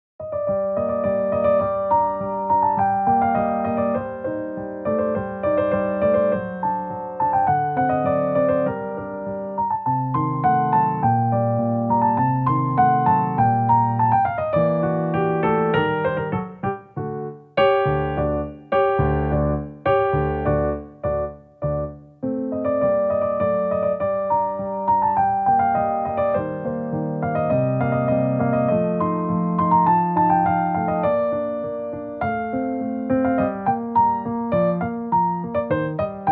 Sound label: Symphony, piano; Mozart